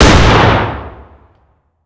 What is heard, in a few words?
fire dist 12ga int; gmod; arccw uc commons attachments